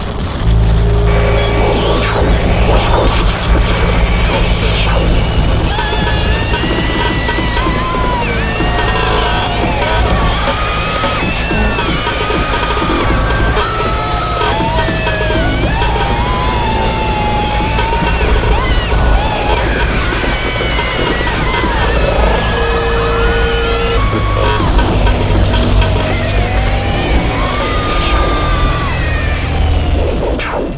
scream for breakz; lolicore

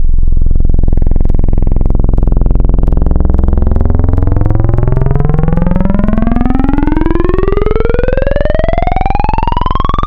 Groan Tube; Synthetic's Serum Collection; Echo Sound Works - Modular

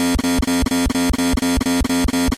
Drum Loop (Fast Mix); lolicore

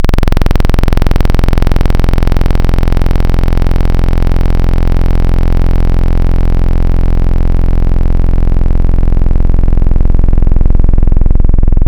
Basics Saw Morph; Synthetic's Serum Collection; Echo Sound Works Core Tables

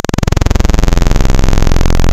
PWM; Analog; Synthetic's Serum Collection; Inception

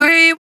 Noises Vocal Shot

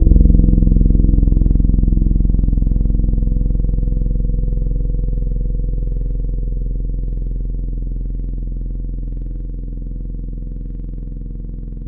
Keys Piano Synth; Synthetic's Serum Collection; ESW X Keys

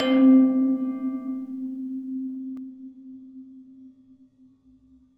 Bell; Synthetic's Serum Collection; ESW Sphere

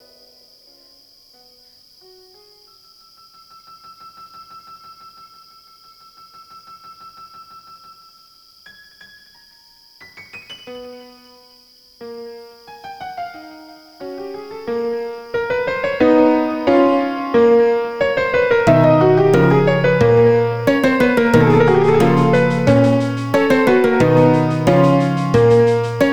piano; lolicore

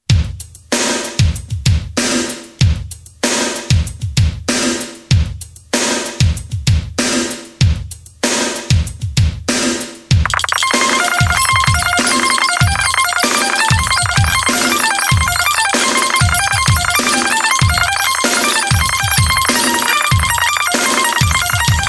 80's drums are cool

